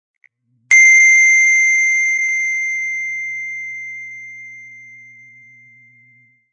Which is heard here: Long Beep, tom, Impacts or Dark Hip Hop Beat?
Long Beep